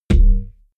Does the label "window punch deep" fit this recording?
Yes